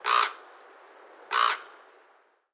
Ambience Menu Crow; subway surfers city sound effects; Android, iOS

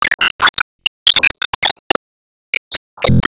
Emo Warcry

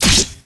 Spike Hit

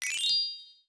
Notice

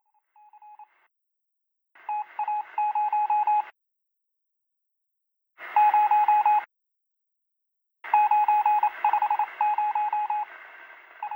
Earth Morse

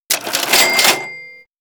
BUY

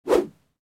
Whoosh low; SFX Slots

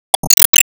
Tap

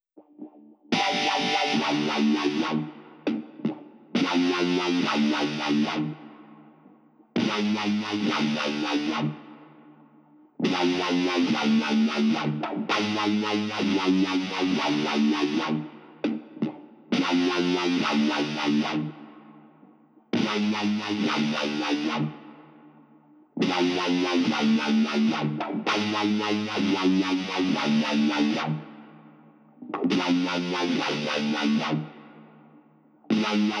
Precipation Guitars 148

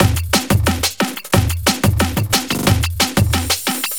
Break 180BPM; M.I.L.F

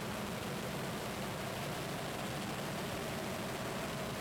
Meteor Approaching; Lethal Company

